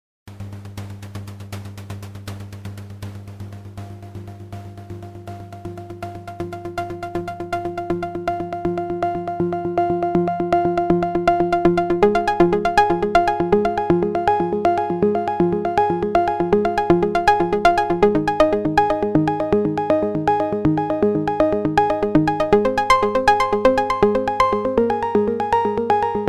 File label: strobe mellow noise arp